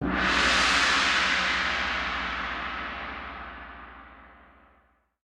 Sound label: free Spin Start; jack and beanstalk